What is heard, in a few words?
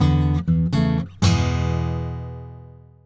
wdn guitar strum